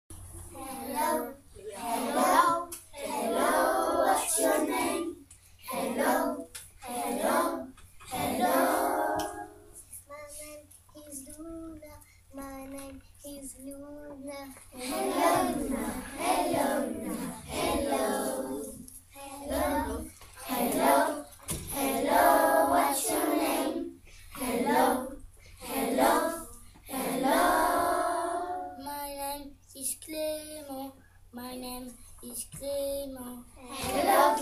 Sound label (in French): anglais hello song